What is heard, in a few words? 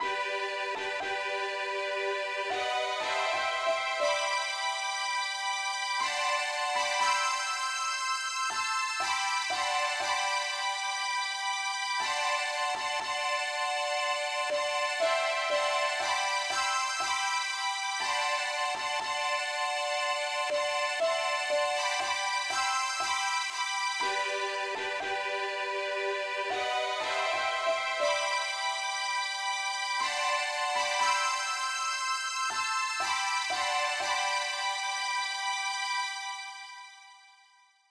mandolin Lead, key g